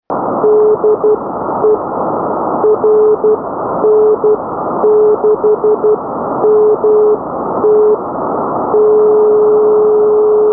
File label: beacon; rn6mt